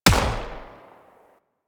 Minigun Shot; Warframe; Eidolon; EidolonMinigunBombard

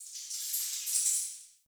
Cave Ocean Creature Close; Ambience; Midrath Island

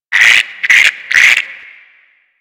Garden Animal; Warframe; Ambience; Clan Dojo; Dojo Garden Animals

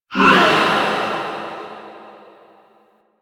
Hand Stunblast No; Duviri

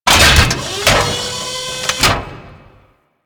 Thumper Bot Hatch Damaged A; Warframe; Grineer

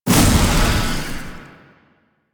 Survival Orb Destroy; Warframe; Zariman

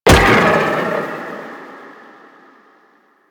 Staff Jab Burst; Warframe; MonkeyKing

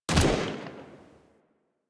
Fire Shot, Weapons; Braton Redux; Warframe

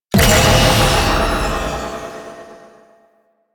Buff Ability Cast; Warframe; Duviri; Duviri Dax; Abilities; Ashigaru

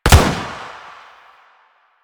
Shield Lancer Pistol Shot 3D A; Warframe; Lasrian; Lasrian Soldiers; Weapons

